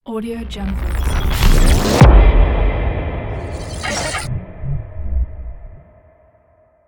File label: glitch logo; ivaproject